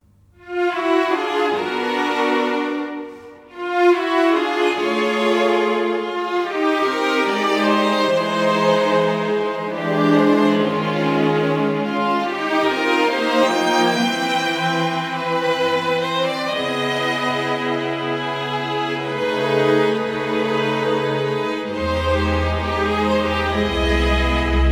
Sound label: sketches for bass orchestra